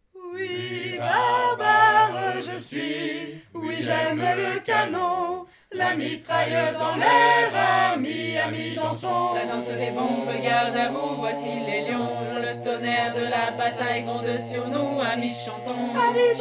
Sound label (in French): chorale